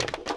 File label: wood movement; Sid Meier's Civilization 4 Complete; Warlords; Units